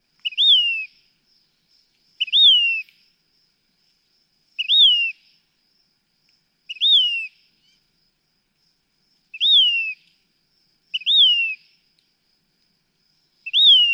Say's Phoebe, By day, a repeated pit-TSEEeeuur, distinctivel